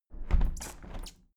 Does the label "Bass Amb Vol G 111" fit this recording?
No